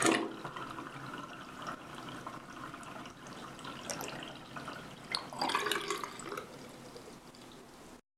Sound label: Drain